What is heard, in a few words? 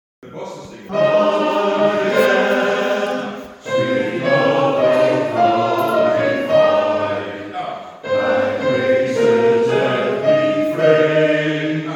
Tenor Bas; Come Again